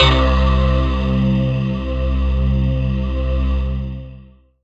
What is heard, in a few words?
pad, Dance Synths Break Pads